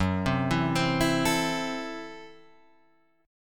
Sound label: F sharp Minor 7th Flat 5th F sharp Guitar Half Step Down